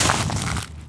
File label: Park Footsteps in Leaves; Moody; Houston